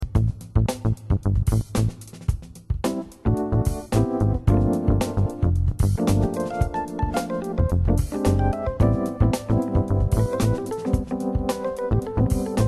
yamaha dx100

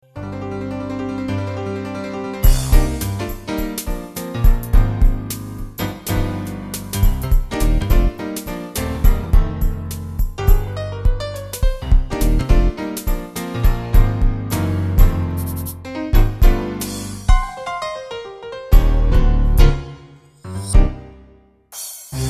roland jv880

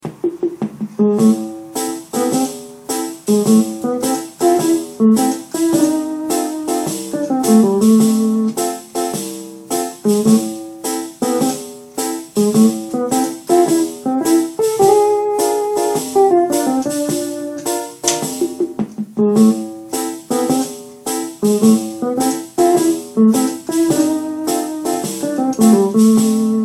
casiotone

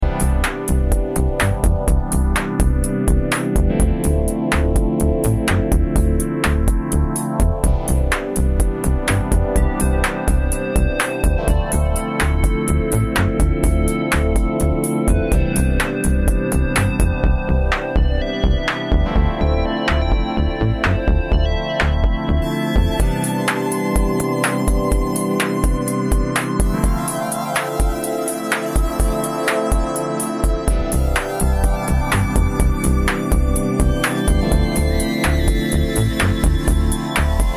yamaha dx100

